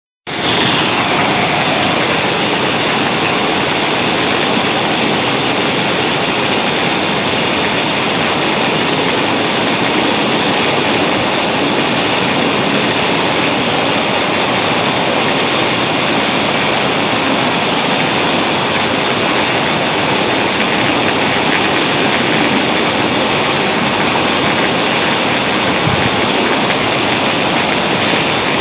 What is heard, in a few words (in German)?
prasseln